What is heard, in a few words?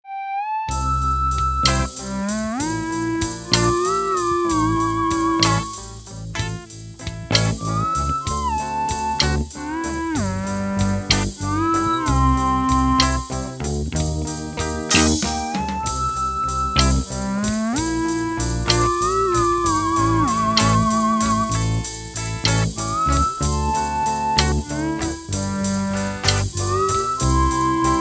Ghost Duet